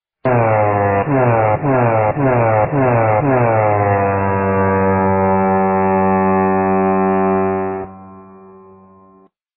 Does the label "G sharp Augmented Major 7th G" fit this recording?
No